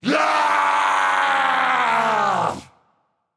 battle cry; smite; voicepack; odin

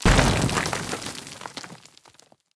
stone destroy; smite; medusa

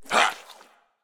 hitreact small

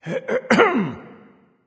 clear throat; chronos

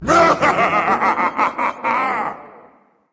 laugh berserk; cuchulainn

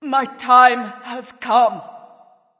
death; smite; nemesis

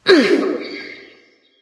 grunt lift light; smite; voicepack; hel

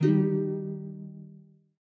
lyre strum; smite; Apollo

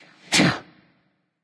grunt attack; smite; ahmuzencab

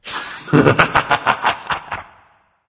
laugh; smite; agni

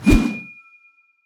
swing; smite; god sobek

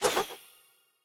Banana Throw; smite; raijin

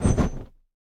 dagger flame; smite; pele

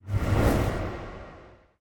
body swish; smite; thanatos

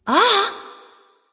surprise; smite; voicepack; skadi

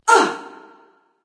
surprise; smite; nox

